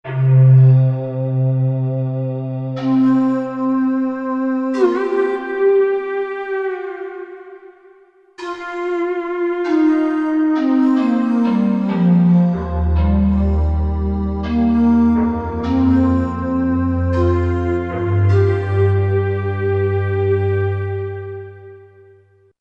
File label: Hard Wind, Korg MSC-10S Ethnic